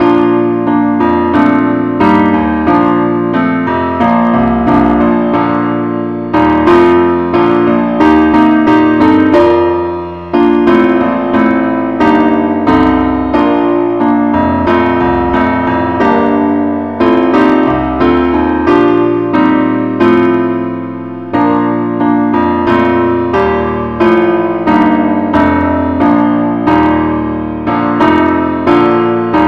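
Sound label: keyboard only, key b flat; christe Sanctorum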